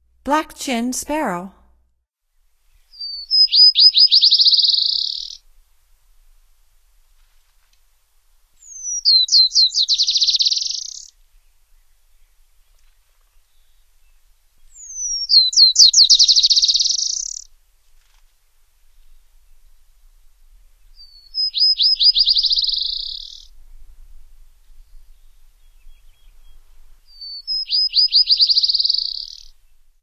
Black-chinned Sparrow, Songs of Birds of North America; Stokes